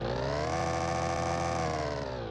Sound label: engine